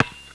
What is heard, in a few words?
Bounce; Working Model Program